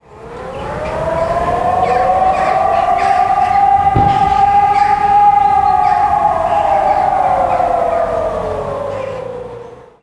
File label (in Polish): syrena; bolesny czas gdy budza sie wspomnienia